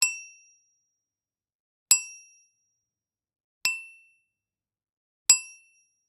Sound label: Generic Click Metal Tiny